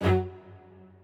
strings

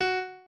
piano

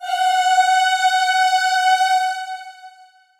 choir

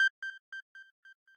arp

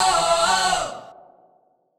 Key chant; Luxembourg Zwee

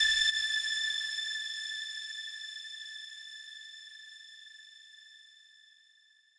lead3

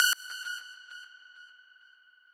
lead

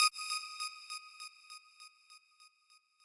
lead

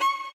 solo

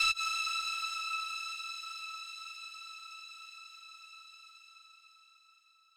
lead

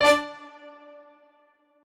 piano

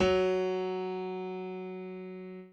piano chord

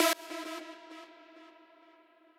lead

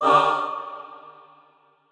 chor

